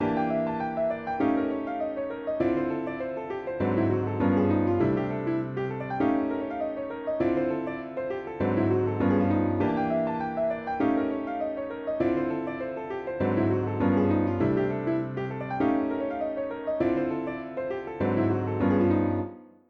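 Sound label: iii-vi-ii-v 4 note sequence piano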